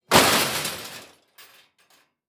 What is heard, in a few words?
Crash Impact Car Crash Small; crashes; AdobeCC